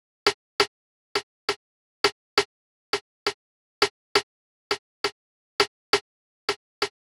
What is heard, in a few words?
Drum Loop 135 BPM Snare, Hip Hop; Cymatics; She Goes